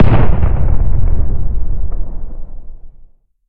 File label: Far Explosion; Stefano Cremona; Explosions